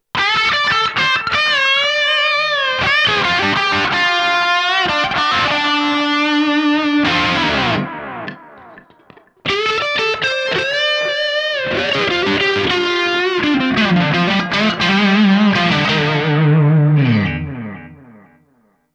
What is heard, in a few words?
Lead delay; Immortal